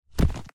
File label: stone hit light